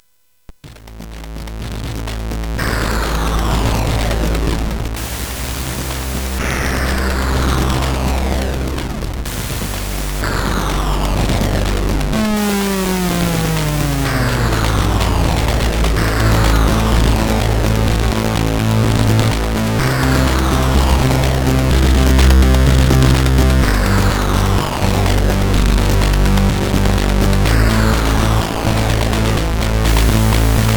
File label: Drum Fantastic, CSG8580R5